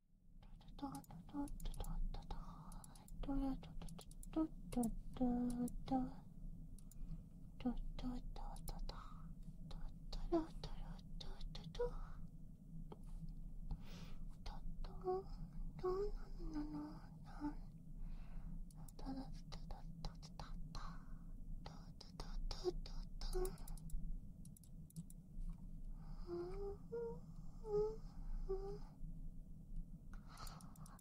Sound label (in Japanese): たくさん「ちゅ」するだけ; KU100